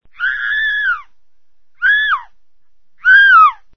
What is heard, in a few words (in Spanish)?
gritos; Sonidos/misterio